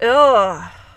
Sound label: disgust